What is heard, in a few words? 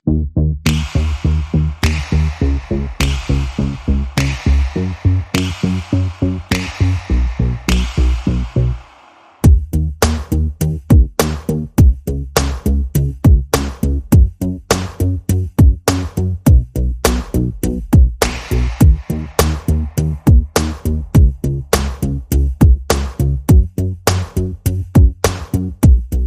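Bass Percussion and FX Only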